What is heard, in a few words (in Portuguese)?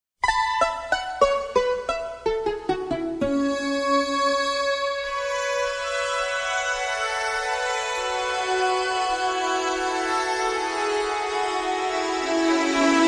Instrumental